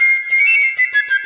flute madness; WORLD LOOPS

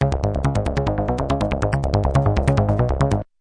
141 cheesy soft acid line; SYNTH AND ELECTRONIC LOOPS

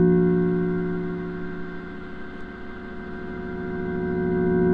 loopy fx pan thingy; LOOPING AMBIENCE

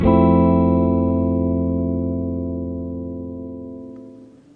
mellow jazz guitar, Mellow Jazz Guitar Chords

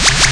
whup ufo, Electronic Hits

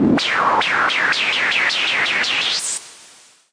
noise Modular, Noise Sweeps

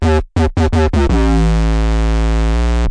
165 harsh buzzsynth bassline, bass loops

